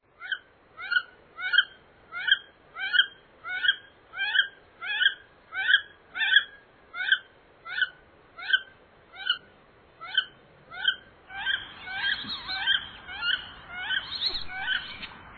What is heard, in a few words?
Wood Duck, bird calls; Envirothon Jr. High Resources; Jr. High Enviro Wildlife Resource Packet